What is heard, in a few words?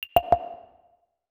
chat msg in bg; Nokia N900